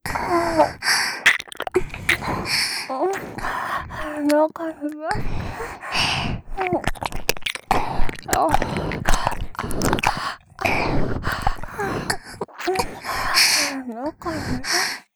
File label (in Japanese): 中に出して 耳舐め喘ぎ; ロッテ